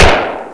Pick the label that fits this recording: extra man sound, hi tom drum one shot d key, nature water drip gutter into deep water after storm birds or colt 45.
colt 45